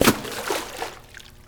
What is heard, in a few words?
frog stomp splash; weapons; dota; largo